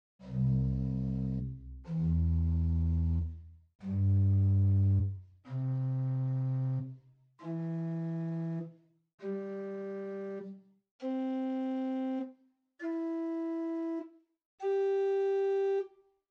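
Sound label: Pedal Prinzipal 8 arp, organ stops; Grace Lutheran, Champaign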